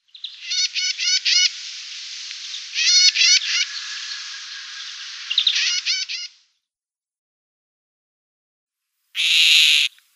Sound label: Great Grey Shrike calls